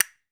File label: Dance Drums; Best Service; ProSamples vol.15; AKAI